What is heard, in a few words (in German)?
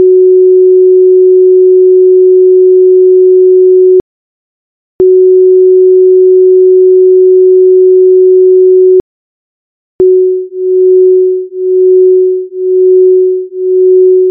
schwebung